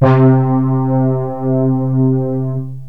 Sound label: syn, loops synth